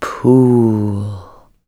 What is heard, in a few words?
Cave